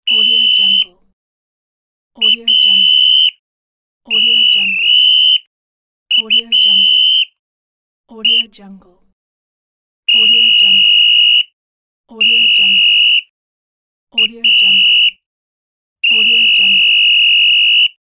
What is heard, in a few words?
referee whistle